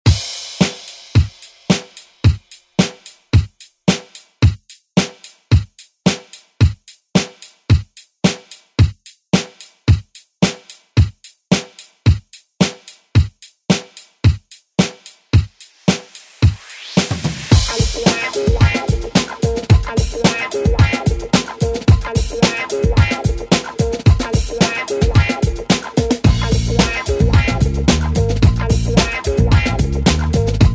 90s ReDrum; DJ Mhark

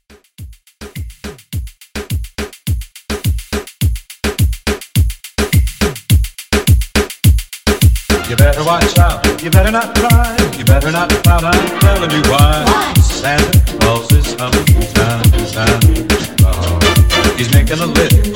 Christmas Moomba

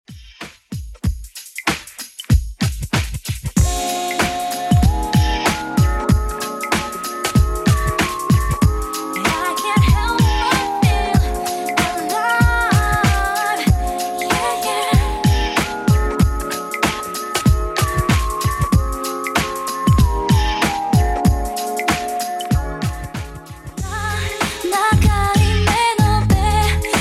90s Redrum 95bpm

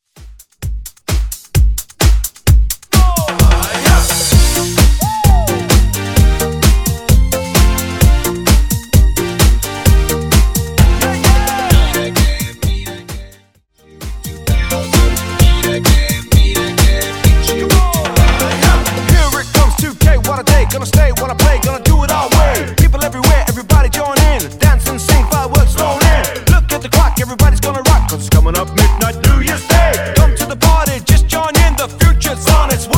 2000s Redrum

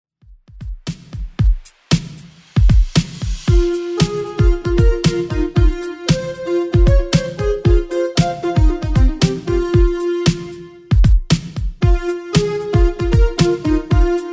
80s Redrum, 115 bpm

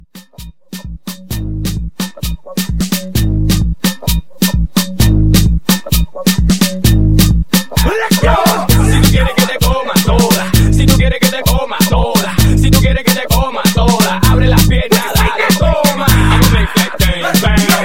Hype, 130

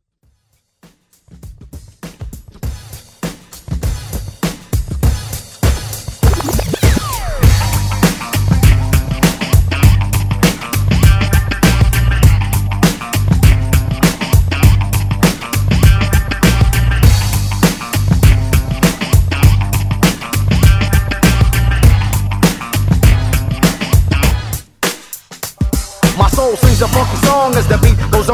Throwback Redrum

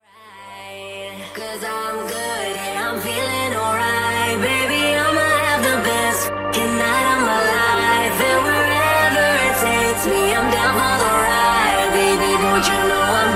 Afro Blend Mashup

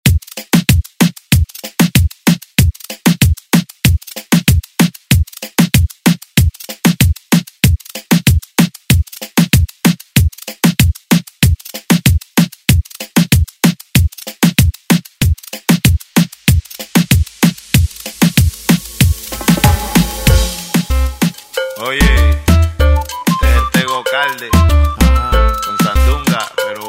Latin Redrum; Deejay Hit

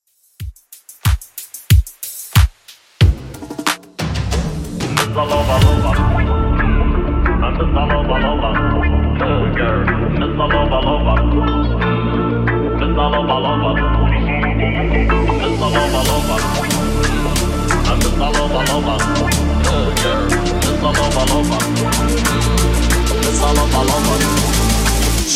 DJ Friendly 124bpm CLEAN

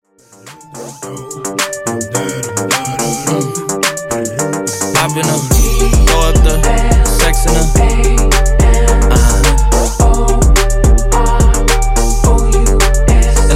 Dj Intro Outro 95Bpm

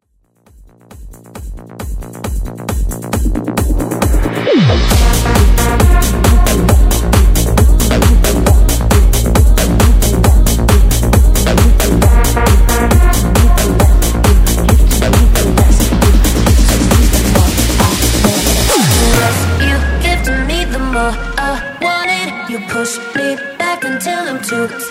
Drums Mash Up

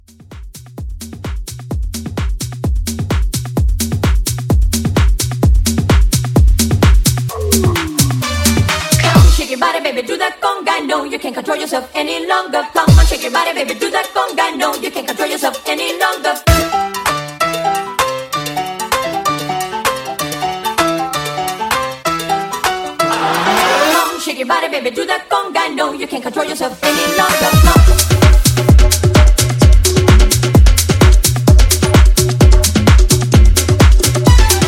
Tribal Bootleg

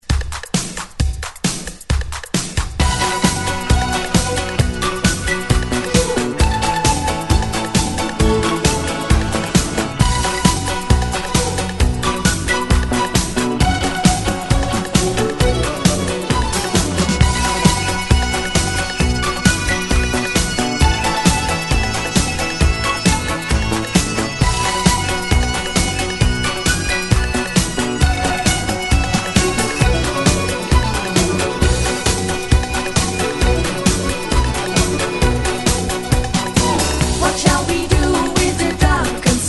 80s ReDrum 133 Bpm

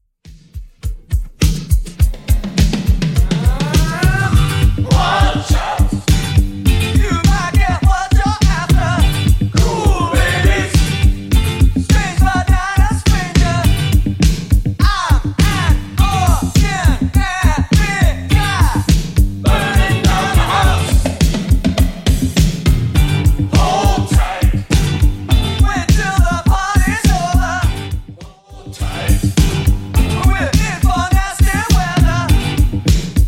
80s 103bpm; Xtend Edit

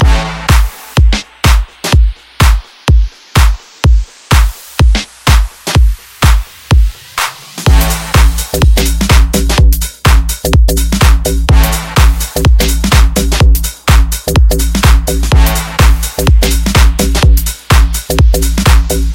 Future House Mash Up Mix 125 Bpm; Vegas Edit

